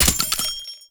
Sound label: Grenade Launcher Shell Casing; Warframe; Scaldra Grenade Launcher